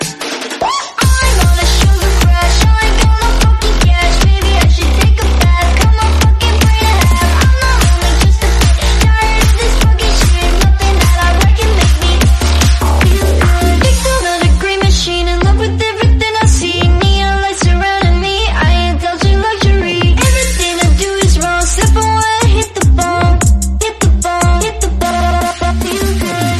Nhac Chuong Remix

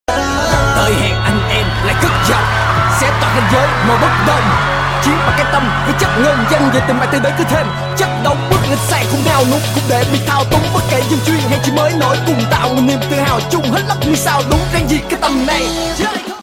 Nhac Chuong Rap Viet

